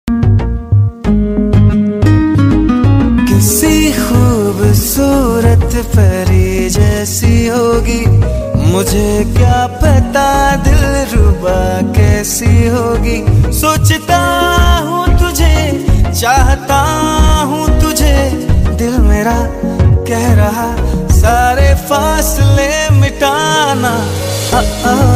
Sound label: Romantic Song Ringtone